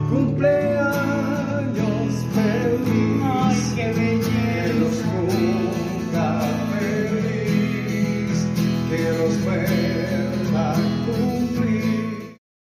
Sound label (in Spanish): Cumpleanos feliz; corto; crepe celebracion